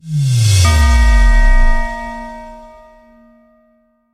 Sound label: bell; axel nosferatu